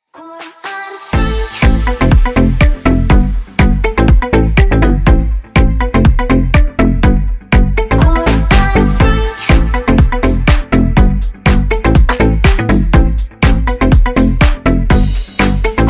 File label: remix ringtone